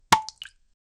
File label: short high pressure water